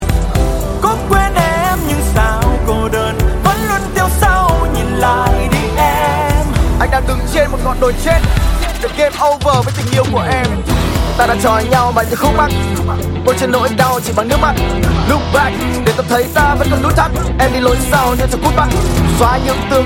rap

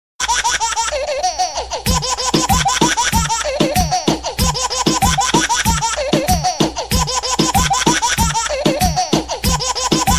laughing baby remix

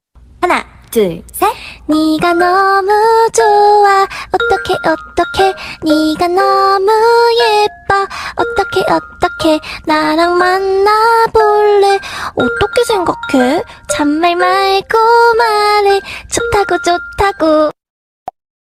Cute Ringtone iPhone

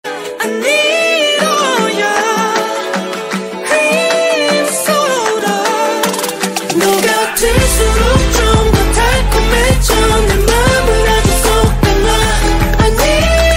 kpop

